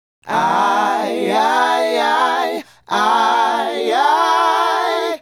Male Group, Hip-Hop and RnB Vocals

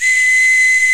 BOTTLES; OMI - Universe of Sounds

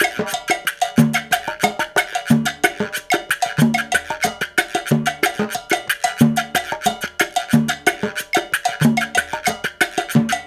Gua Guanco; Spectrasonics - Supreme Beats - World Dance

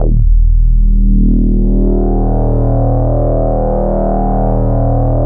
DIVE, ARP 2600; Big Fish Audio; First Call Vintage Keyboards

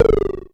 Klone Dual Percussion Synthesiser tom

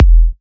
Kicks E; markus hakala

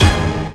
Section Hit Ab Maj; Digital Sound Factory Xtreme Lead

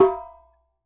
drum, mixed drums, World Instruments

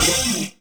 chords

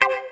Attitude Wah; Mo' Phatt; Digital Sound Factory Mo' Phatt

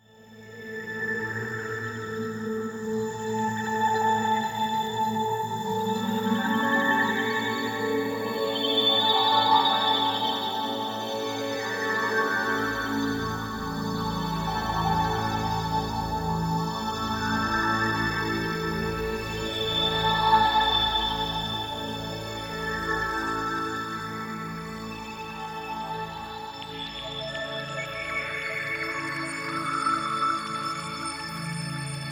Guitar Textures

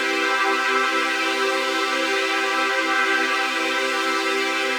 Pad Loop C, shimmer and sparkle; Pad Loops

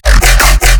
BASS SHOT F; Mercyless

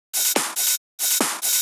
Loops 140 BPM Hihat Percussion Loops

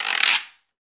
Guiro Wood; Club-50 - Foundations Roland

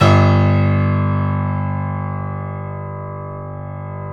KEY, Steinway ff; Steinway M

